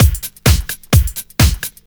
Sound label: Clubhouse Loop 128 BPM